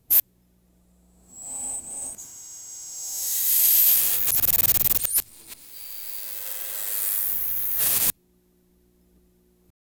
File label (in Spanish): sonido de una cinta, descending tone